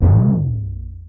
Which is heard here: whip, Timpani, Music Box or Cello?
Timpani